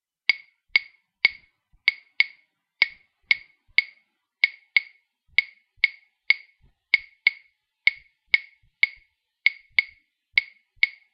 claves

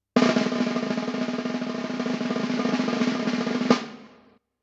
Drumroll

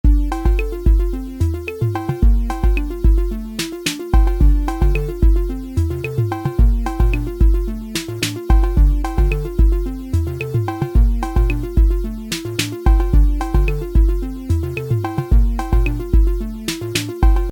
Loop

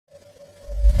Analog Bell

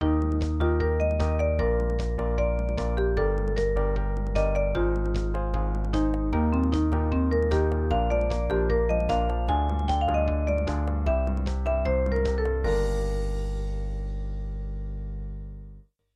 music

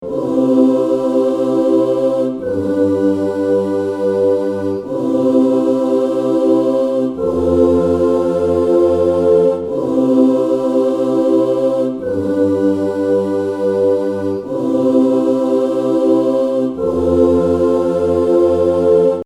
Backing Voc

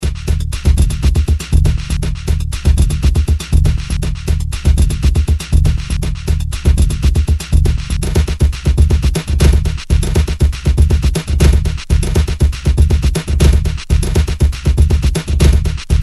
Batucada; OpenSounds Evangelos

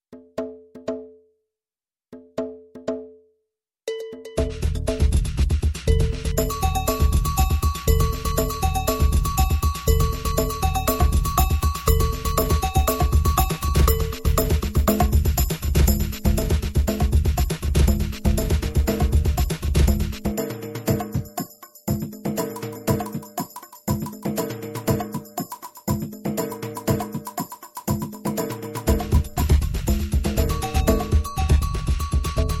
Batucada mix

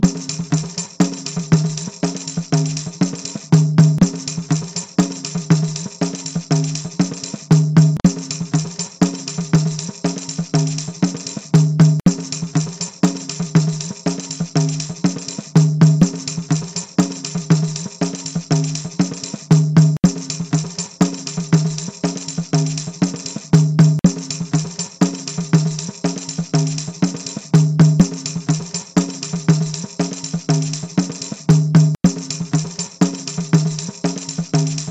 Batucada Pandeiro

